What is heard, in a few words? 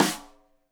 Rim Shot Stereo; Drum; Deeply Sampled Drums; SMDrum